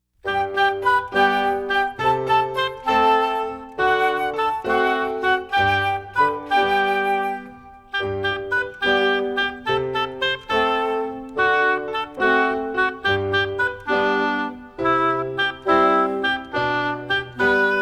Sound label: music only; stand up